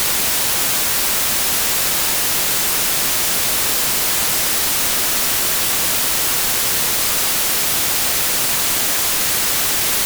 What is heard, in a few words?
White Stereo 192kHz